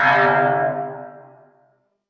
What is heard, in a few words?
portal ambient; Sfx; freepixel; Tilter